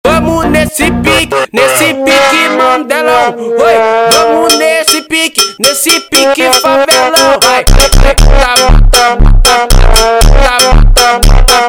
slowed

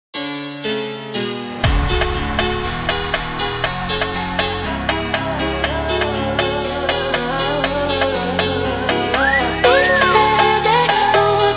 Hardstyle slowed

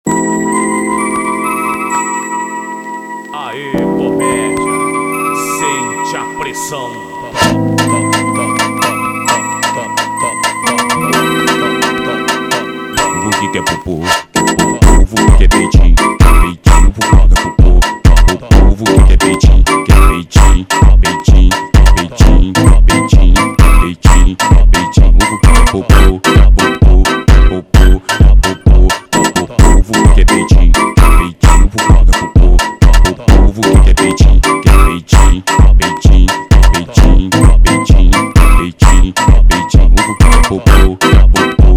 Funk

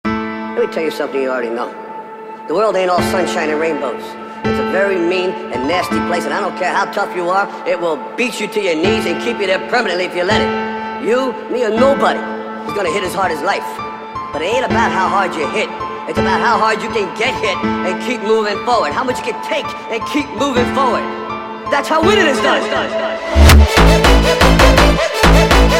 Funk super Sped Up

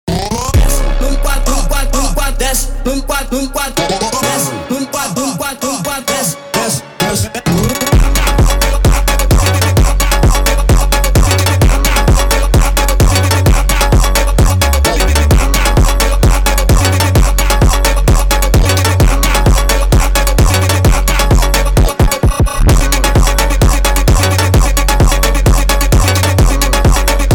Desce Samba

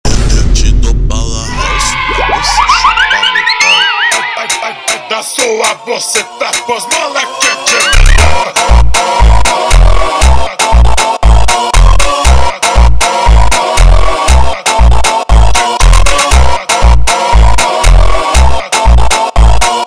Funk slowed

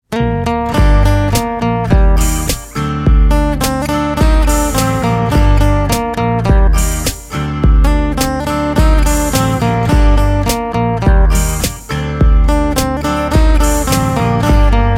meme Guitar

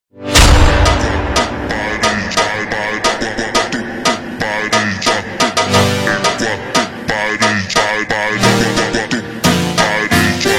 Ultra Slowed

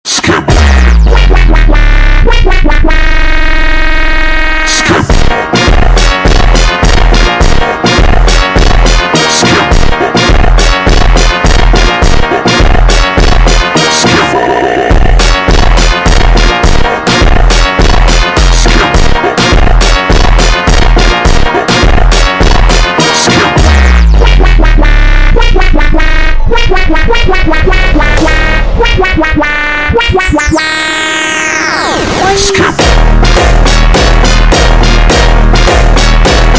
Funk super Slowed

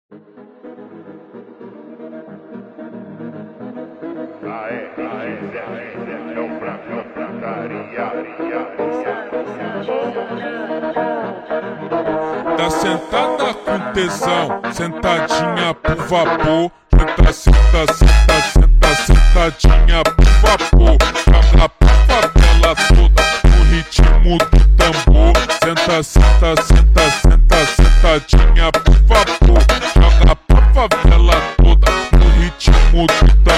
FUNK Super Slowed

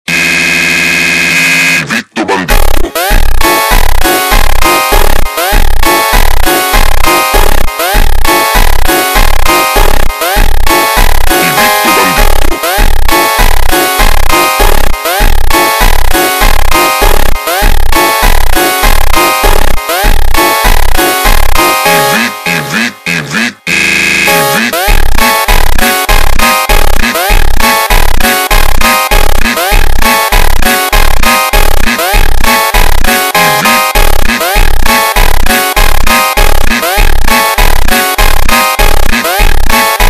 Funk ultra Slowed

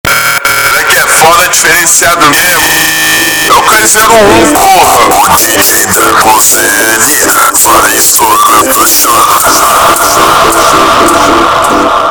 meowsynth Slowed

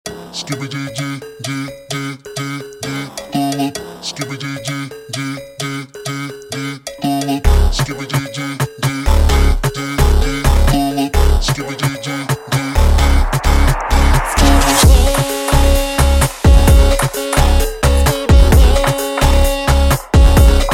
phonk; Skibidi Toilet

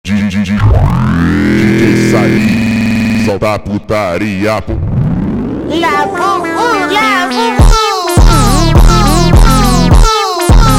Funk slowed

